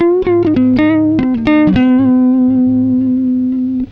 SOLO LICK, Gtr; PlatinumPhattCD2; E-MU Producer Series Vol. 8 – Platinum Phatt

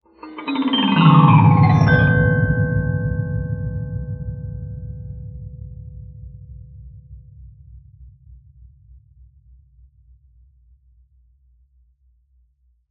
GLISS; SPECIAL FX; Xsample VOL-1

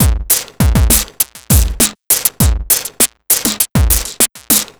Noise Beat; Drums & Drones

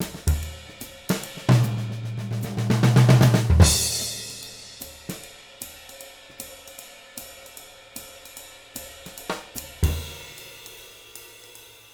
SWING, Living Drums

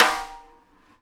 Live Snares

